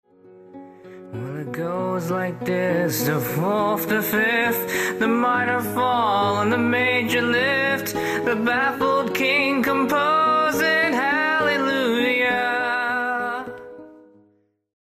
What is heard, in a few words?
Acoustic Version